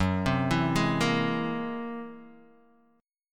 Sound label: Gb 9th Flat 5th Gb down Guitar DADGAD, chord